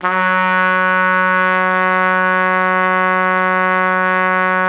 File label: F#2, Pop Brass; Best Service ProSamples vol.19; AKAI